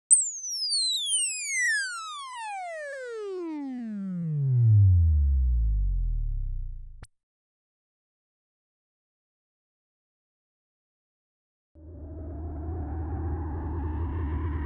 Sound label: special FX, 2 sounds; FX & Atmo; Klaus Schulze Collection